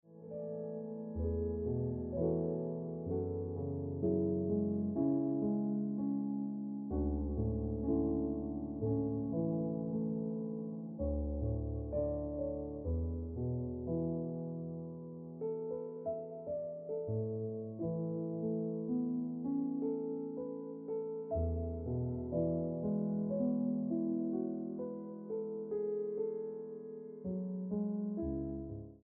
Ringtone Piano Music

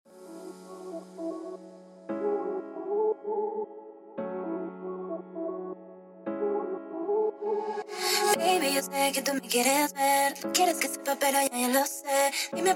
Ringtone

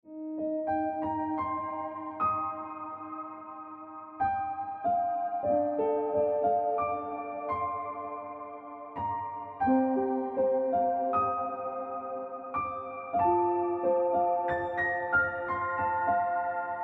Ringtone Piano Music

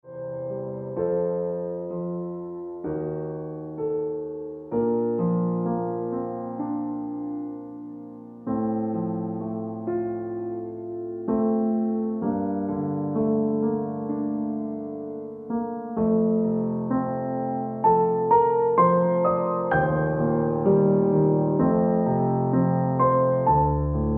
Piano Music

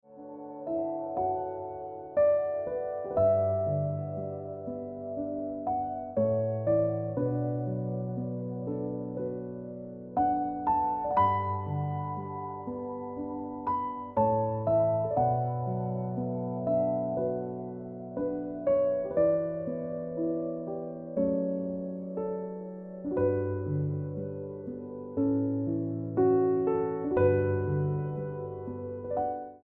Piano Music

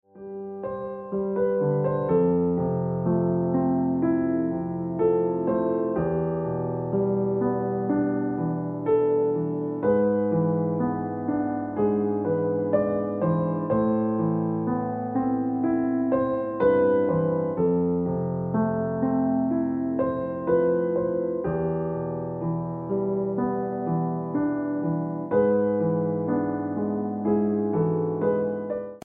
Ringtone Piano Music

